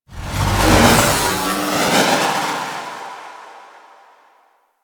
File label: Idle Power; Warframe; Excalibur